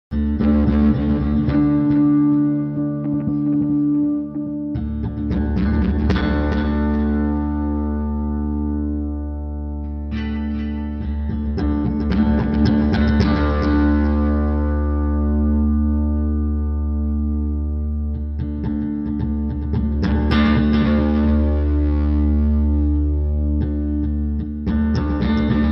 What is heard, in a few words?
a sad story guitars